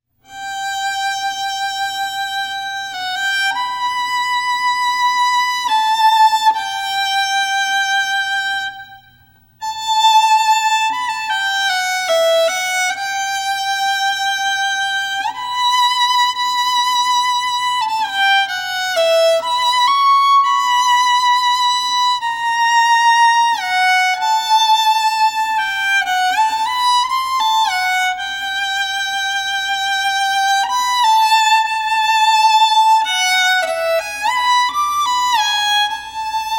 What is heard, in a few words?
Ave Maria solo violin